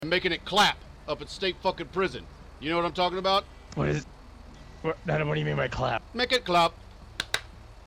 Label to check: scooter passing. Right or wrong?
Wrong